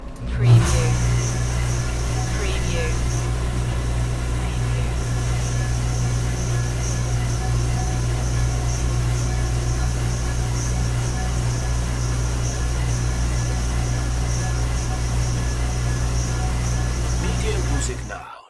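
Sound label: Industrial Dishwasher, Commercial Kitchen Noises; General sound effects heard in bars and restaurants from glasses clinking, mixing drinks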